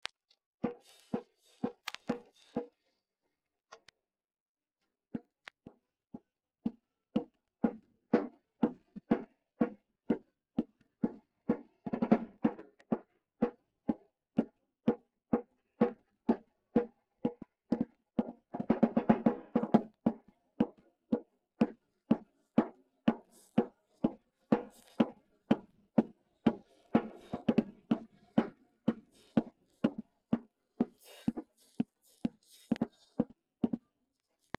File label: drums